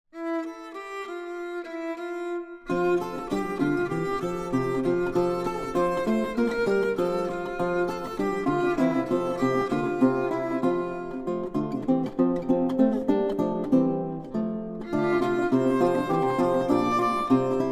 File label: instrumental; margot labourez les vignes